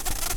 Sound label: bumblebees, Pascuorum queens